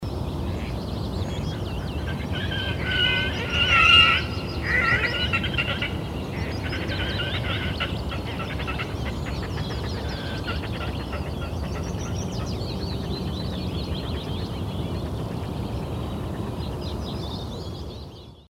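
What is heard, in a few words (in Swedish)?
Gråhakedopping; Red-necked Grebe; Podiceps grisegena; Niels Krabbe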